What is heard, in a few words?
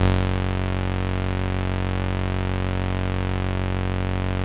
505, String Machines